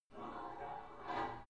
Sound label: take off light weight barbell soft reverby, fitnessstudio; sport freizeit